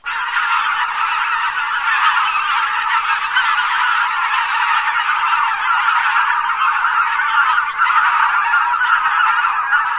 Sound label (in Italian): oca lombardella, birdsound